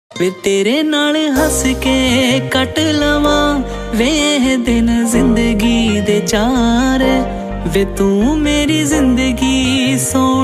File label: song ringtone